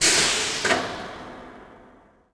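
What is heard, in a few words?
ambience interior steam; Tony Hawk's Project 8; XBOX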